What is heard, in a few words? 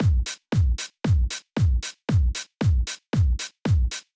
Drumloop 115bpm, Nu Disco; Zero-G